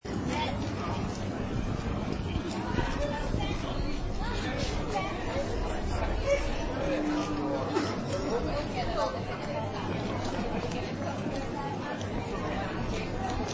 Light City Pedestrian Street, Light city ambience. Pedestrian street, close level. People walking; Light city ambience. Pedestrian street, close level. People walking, talking, very distant light hum of cars.